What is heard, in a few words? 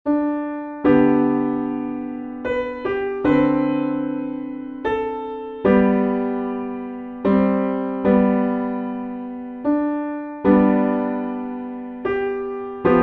piano backing, hymns; Amazing Grace